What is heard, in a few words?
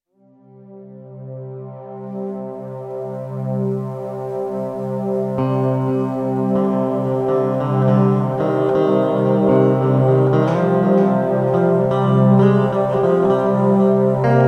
deep guitar